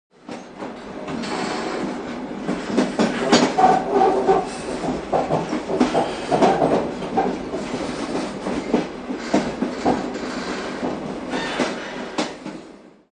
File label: village train